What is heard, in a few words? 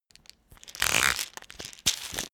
pillbox open